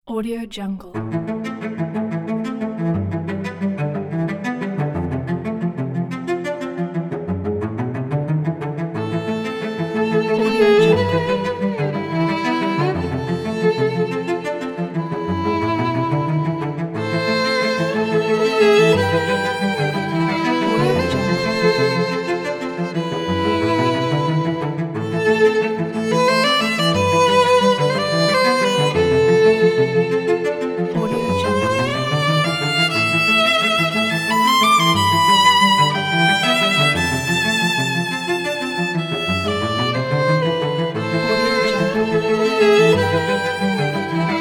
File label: modern cello violins